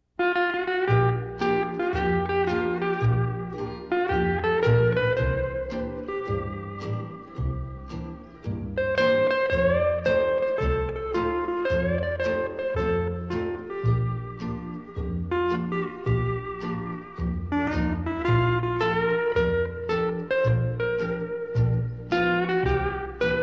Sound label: Instrumental